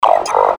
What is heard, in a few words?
enter